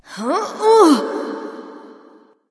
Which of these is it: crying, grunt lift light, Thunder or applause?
grunt lift light